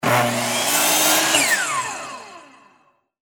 electric fret saw

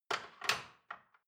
dishwasher cutlery place soft

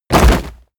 bodydrop leather

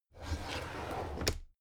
drawer cabinet crammed open regular

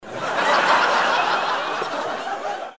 laugh

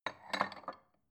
bowl shelve soft

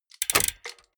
mechanics small rattle

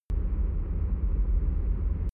drone spaceship rumble soft

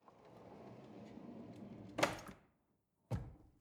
drawer cutlery close draw soft

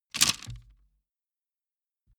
wood sticks small break

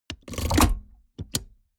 mechanics medium snap

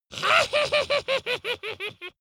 dwarf laugh sneaky

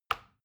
plastic button tiny push regular

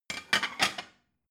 plate shelve slide

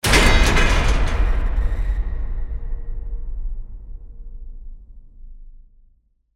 heavy gate impact

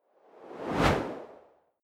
whoosh simple

